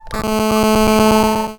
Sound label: Mobile Interference